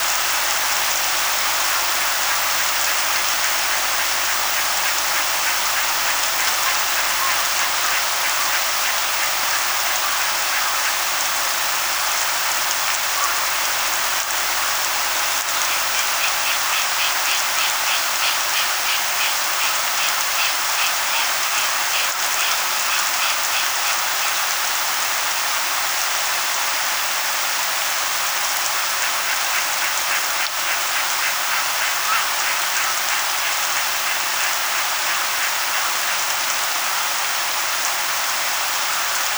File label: rain